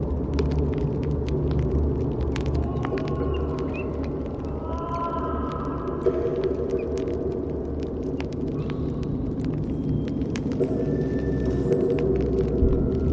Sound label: ambient sounds, thief; Heroes of Holdem